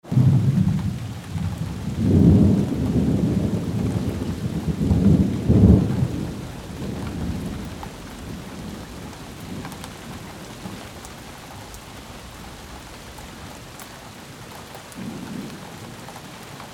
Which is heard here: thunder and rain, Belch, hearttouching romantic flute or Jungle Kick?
thunder and rain